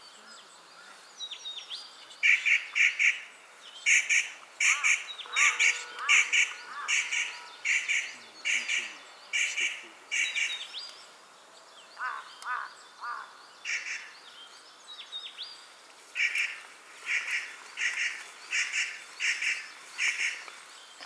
Kashmir Nutcracker Nucifraga multipunctata, large spotted nutcracker call